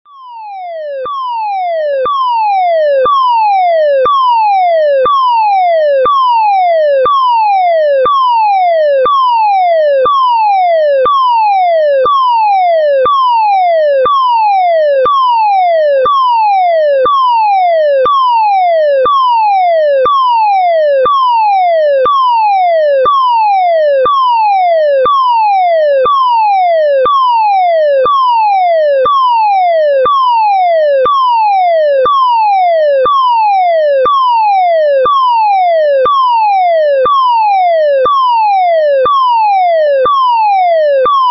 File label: German Fire Alarm